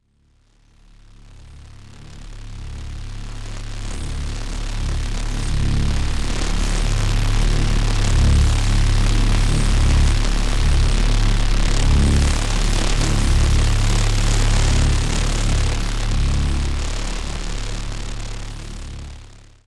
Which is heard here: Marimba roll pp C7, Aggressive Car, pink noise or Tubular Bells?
Aggressive Car